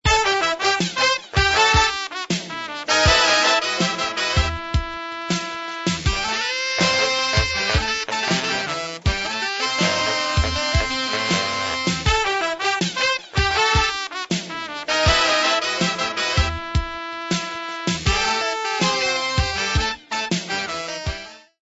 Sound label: saxo funk, jazz; Música sin copyright gratuita para cuñas de radio